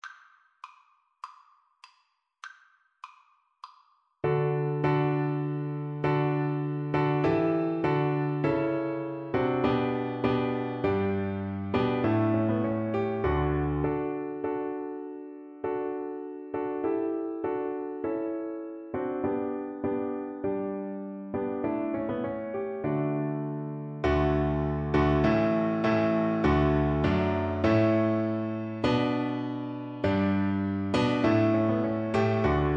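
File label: VLC karaoke, Cello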